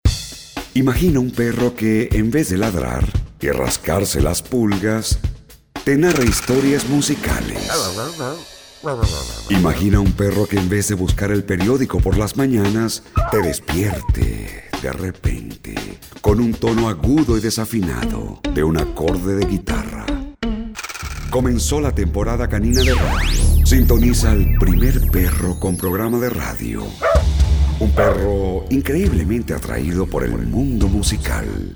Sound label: gas Station Dog